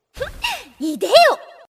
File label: wrench shoot; Miku verano